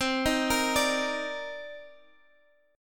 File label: C Minor 9th C Guitar Half Step Down; Cm9